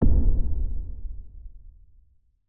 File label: Dome Punch